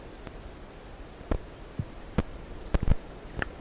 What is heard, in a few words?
Orinoco-piculet, پرنده دارشکنک اورینوکو; صدای پرنده دارشکنک اورینوکو